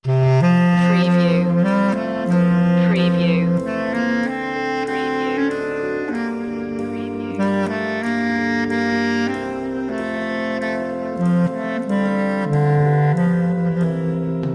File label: clarinet